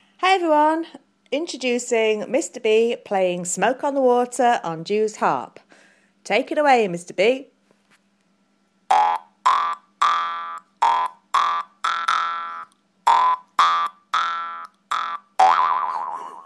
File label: jews harp